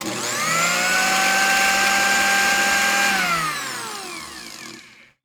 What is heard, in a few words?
Blender on Chop; Appliances; 1001 Sound Effects